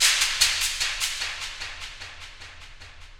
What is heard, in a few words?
Shaker